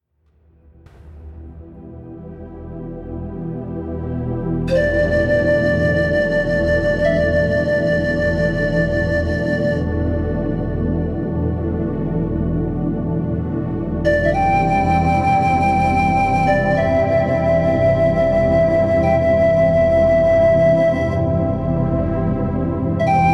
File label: The Power of magical drums; spiritual healing dreams entspannungsmusik